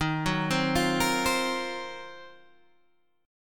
D sharp Minor 6th Add 9th D sharp Guitar Open G, chord